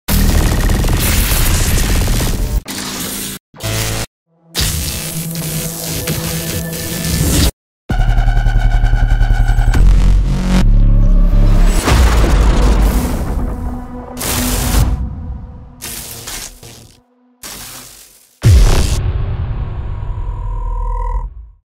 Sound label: Electric pulse; پالس الکتریکی